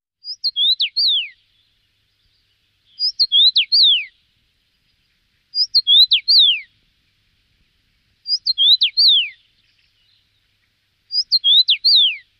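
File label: obyknovennaya chechevica golos, common rosefinch voice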